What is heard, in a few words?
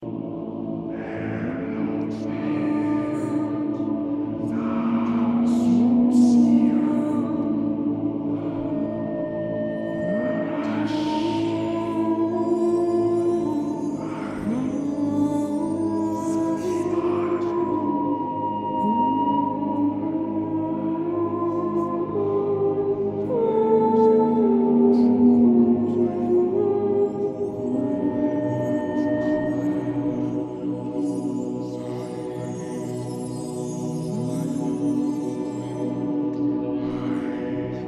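Elven Instrumental Ambience - Summoning Ceremony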